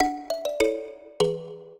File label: minuet, mbira; Mozart